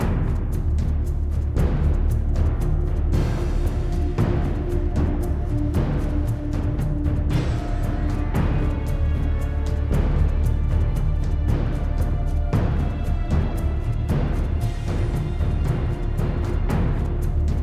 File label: Battle Ruse; Eon Altar